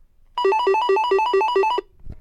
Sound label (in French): Telephone GIGASET DA710 Sonnerie